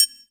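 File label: TRIANGL, drum machines; JV-1080 DRMS; EdgeSounds; Drum Mashines VOL-1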